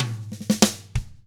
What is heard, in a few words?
95 funk, drums